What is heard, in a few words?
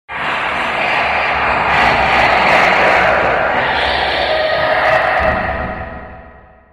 chasing; phonophobia; zerosiki; slender